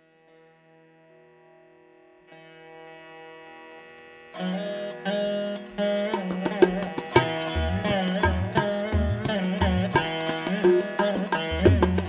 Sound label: Sitar Music Of India; Varnam-Mohana-Ragam-Adithalan